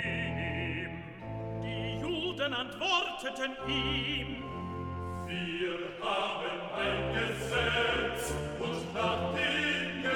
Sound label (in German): SOPRAN, Stimmen mit Klavier; Johannes Passion